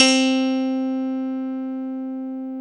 pop piano; Roland L-CD701; Pop Pianos 4; Pop Pno + P3

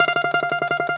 DOMRA; E-MU Producer Series Vol. 7 – Old World Instruments

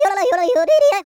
YODEL; ILIO; Vocal Planet

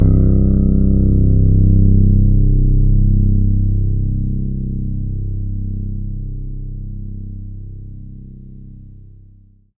C1, Synth Line; 90 s Sample CDs; NiceBeats; SynthLine VOL-23

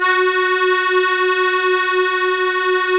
KEY, Accord Duo; Club-50 - Foundations Roland; Accordians

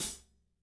16 HAT, DRY KIT; Spectrasonics; Burning Grooves CD2; DRY KIT 4

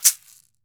CAXY, CAXIXI; Brazil

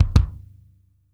FLAM, KICK DRUM; Sampleheads; New York City Drumworks VOL-1

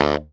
BARI FF D 1, saxophones; Greytsounds - Philip Wolfe David Crozier's Saxophones; BARI SHORTS

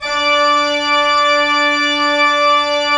ORG, CHURCH ORGAN; Roland - LA Composer vol. 1

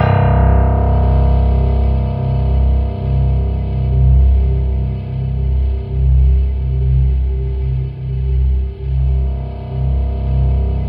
C1, SynthLine; 90 s Sample CDs; NiceBeats; SynthLine VOL-22

